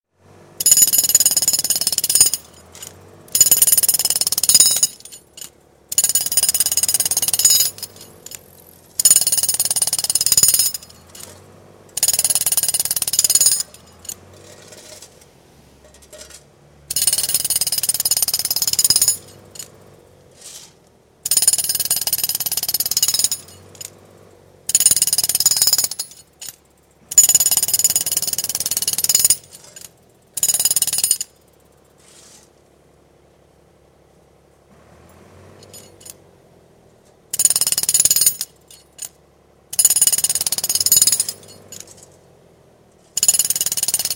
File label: Quarrying, road drill with compressor; Miscellaneous Office; BBC